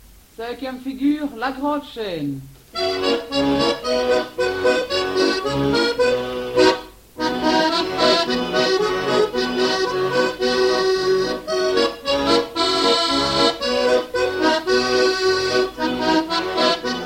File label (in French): Quadrille, Belgique